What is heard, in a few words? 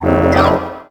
Hit